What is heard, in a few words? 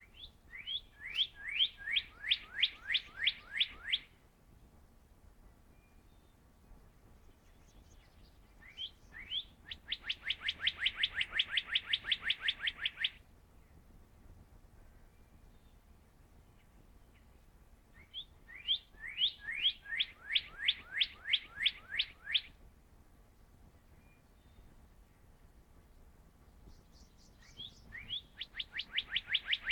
Cardinal